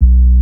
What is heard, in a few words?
sub B, vocal house; PS-28 Vocal House Samples; basses